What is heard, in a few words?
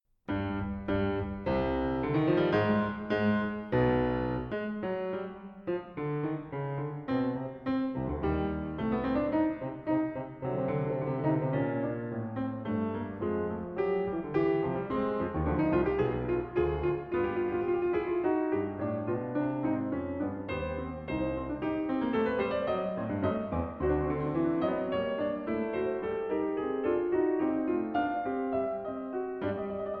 Mozart Fugue in C Minor for two pianos; KV 546 426